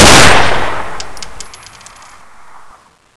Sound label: beretta unsil